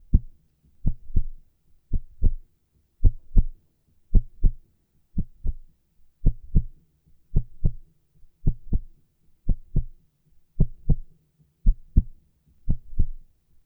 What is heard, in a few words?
Dance Track